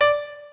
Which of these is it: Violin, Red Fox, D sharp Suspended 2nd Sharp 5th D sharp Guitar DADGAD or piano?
piano